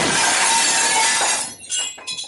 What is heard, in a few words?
glass shatter